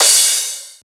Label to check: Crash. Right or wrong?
Right